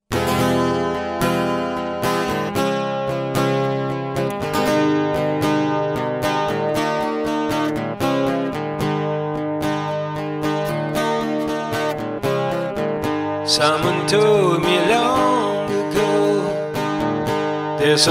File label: Acoustic